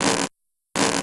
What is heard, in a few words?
tug